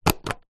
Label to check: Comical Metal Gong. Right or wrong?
Wrong